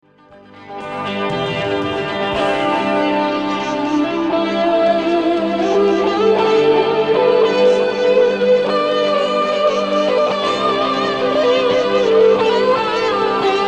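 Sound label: Isolated Guitar; Torino 1994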